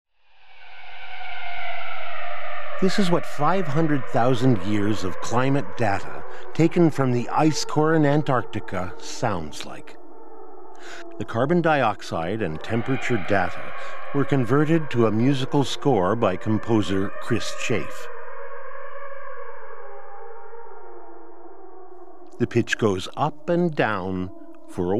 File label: climate data sonified